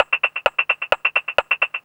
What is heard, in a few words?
130 addon, DRUMLOOPS, HIHAT; Ueberschall; Techno Trance Essentials; LOOP ADDON3